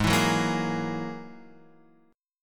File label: Ab 7th Suspended 2nd Sharp 5th down Guitar Open F; Ab7sus2#5 strummed